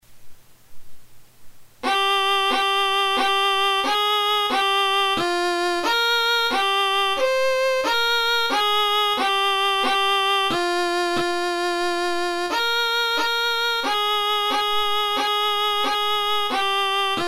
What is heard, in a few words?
soprano midi